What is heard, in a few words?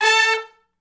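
Hi Short Note (A) unison, horn section, R&B; Crimson Blue and Fabulous Horncraft 4 RnB; Horncraft for R&B; Sections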